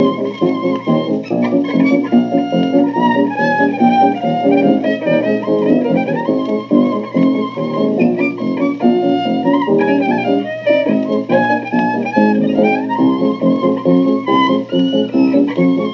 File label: Galop